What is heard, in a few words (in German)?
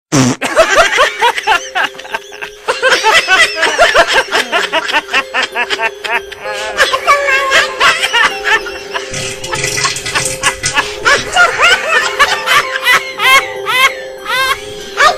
Lachen Furtzen